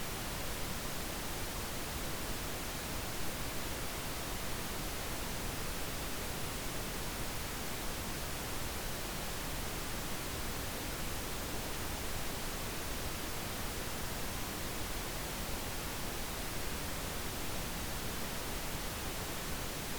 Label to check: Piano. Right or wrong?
Wrong